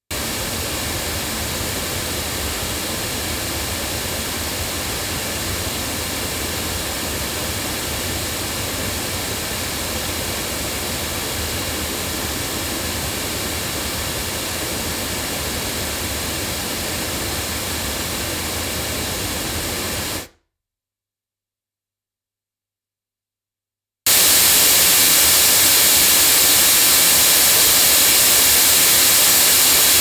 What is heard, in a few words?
ND6000 loudspeakers; JJLabs